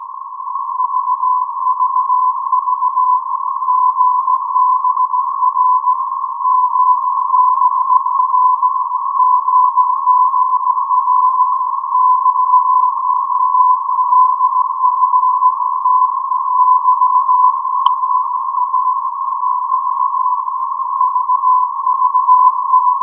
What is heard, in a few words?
Tubular Bells Morse Code